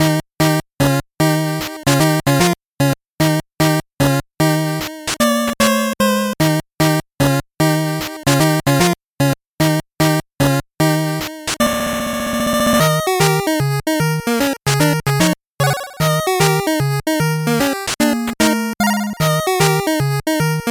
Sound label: 8bit bgm slow